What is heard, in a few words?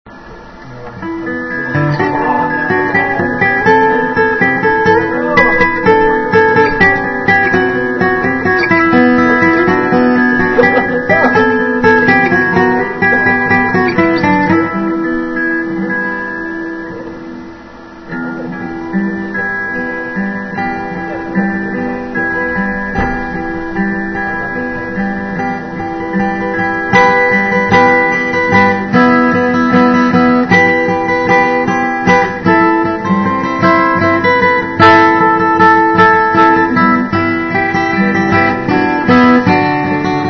new song duet